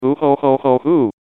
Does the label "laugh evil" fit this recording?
Yes